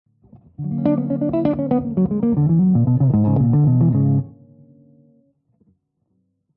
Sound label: Lydian Jazz Lines; Lydian 5